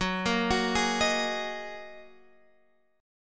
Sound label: A sharp 7th Suspended 4th Sharp 5th F sharp